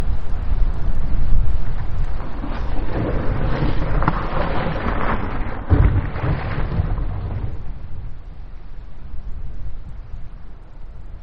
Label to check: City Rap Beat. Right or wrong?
Wrong